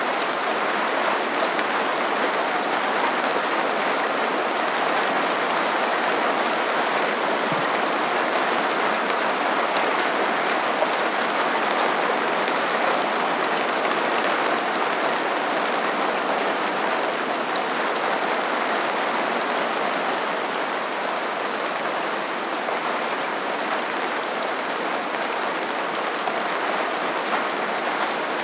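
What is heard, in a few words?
ambience rain light outside under roof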